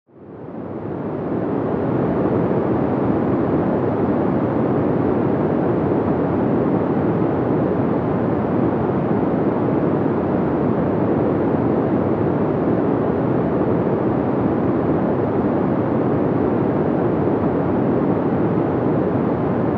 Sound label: Pink Noise